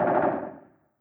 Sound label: fog shooting